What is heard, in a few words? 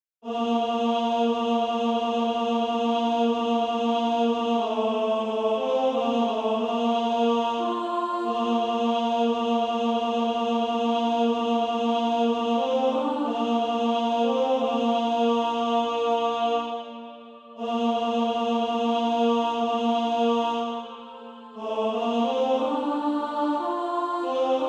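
Female Choir, alto 2; O how I love Jesus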